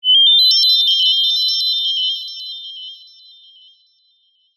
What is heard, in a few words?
fantasy magic twinkle short subtle